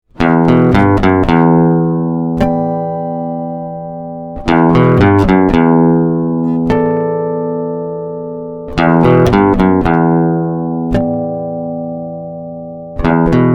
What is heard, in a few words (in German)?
Gitarre Repair